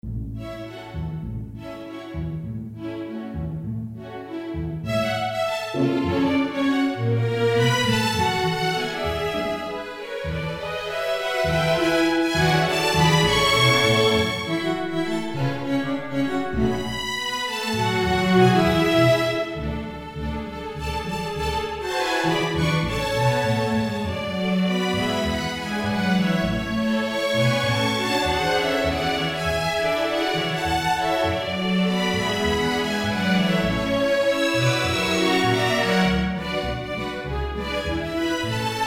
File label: string orchester